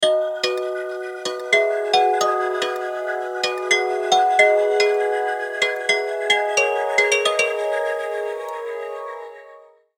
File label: Grand B 110, Dancehall Afrobeats MIDI Melody; Dancehall & Afrobeats MIDI Melody Collection